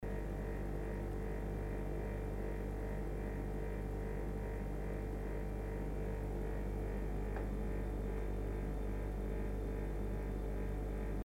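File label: sound of refrigerator; zvuk holodilnika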